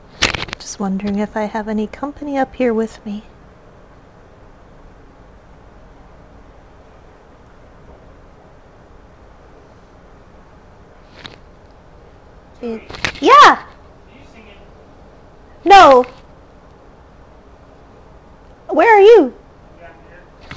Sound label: Tui ru singing Tuis audio